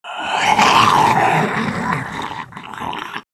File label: female zombie attack; nmrih; zerosiki; slender